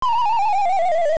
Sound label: Fall; Link falling in a hole; Oracle